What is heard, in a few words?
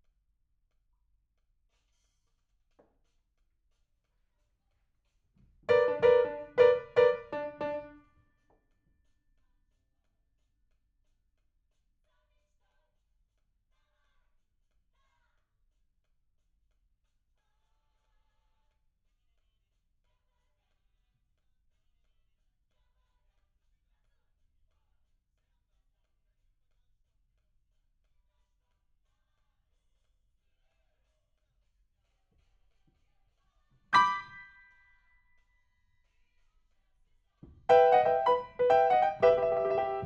PIANO, RNR for piano